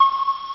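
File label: MENU CANCEL; axisdemo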